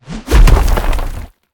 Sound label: idle rock up; smite; god merlin